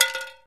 conserva; STALKER; IWP

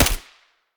sa58 silencer mono distant

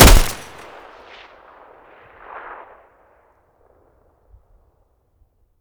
mg muzzle mono, weapons stationar

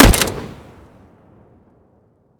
m320 muzzle mono, weapons

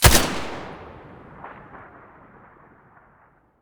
scar muzzle mono

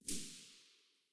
aug silencer mono very far, weapons; STALKER; IWP

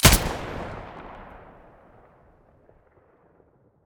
aug muzzle stereo, weapons; STALKER; IWP

